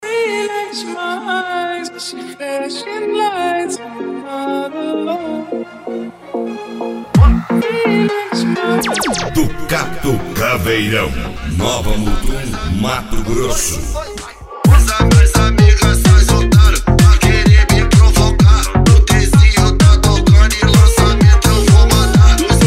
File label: especial mega funk